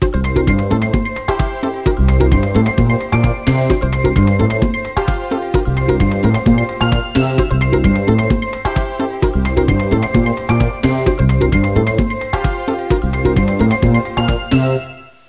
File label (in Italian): suonerie contemporanea; musica contemporary